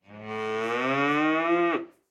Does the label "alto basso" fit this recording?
No